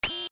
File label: alarm